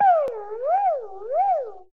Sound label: wowowow, zaps and beeps